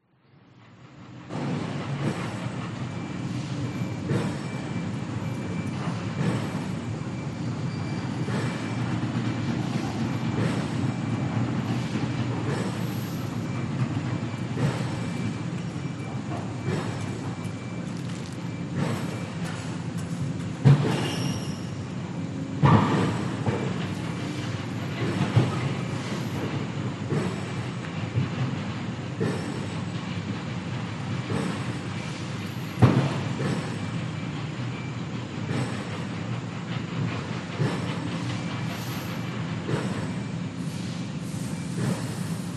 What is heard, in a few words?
construction site heavy water pipeline work drilling pounding trucks